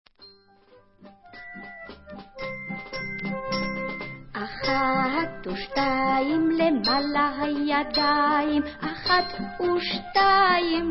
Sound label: Folk